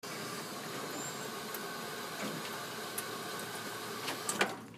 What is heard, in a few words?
water fountain